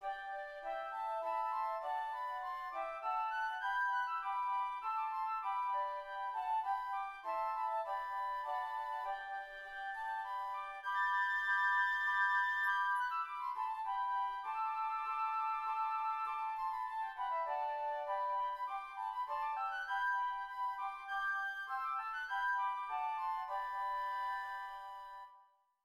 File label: Flutes; God Bless Our Native Land (America)